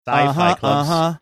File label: singing uh huh uh huh uh huh